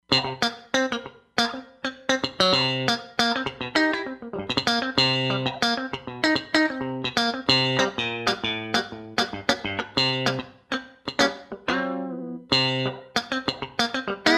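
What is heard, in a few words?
Funk Guitar, Roland Fantom XR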